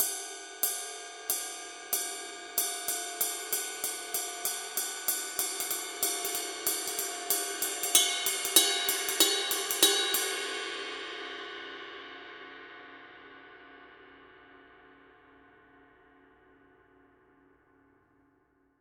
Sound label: dark energy ride mark ii pattern; Poids: moyen; signature dark energy 22; paiste